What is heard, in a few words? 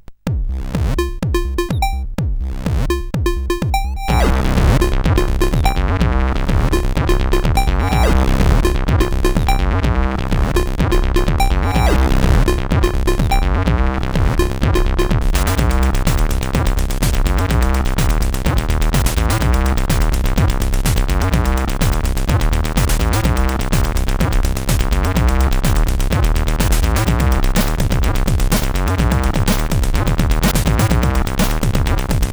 Tanz, CSG8580R5; Achtueng Tanz